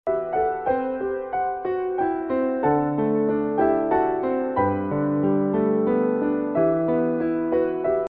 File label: piano melody